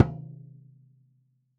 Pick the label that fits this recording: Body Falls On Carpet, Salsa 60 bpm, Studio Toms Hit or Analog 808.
Studio Toms Hit